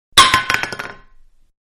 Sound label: Small Can Fall On Wood; Metal (Sound Effects:Materials); Can Small-Falling Onto Wood; Manhattan Production Music